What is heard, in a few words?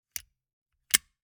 Gumball machine, candy door, plastic, open, shut, hyper; Eiravaein Works; Vaeyan IV